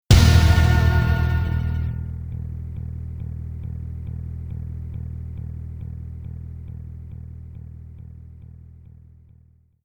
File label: Kombat Tomb - Round Over; Mortal Kombat II; arcade 1993